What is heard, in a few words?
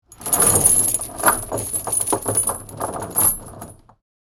Chains dragging on wood